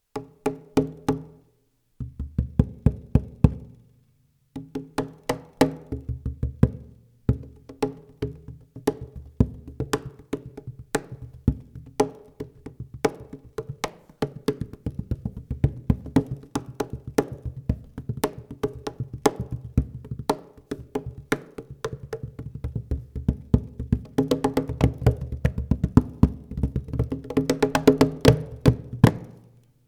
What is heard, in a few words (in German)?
Meinl Cajon ohne Teppich; Meinl Cajon Test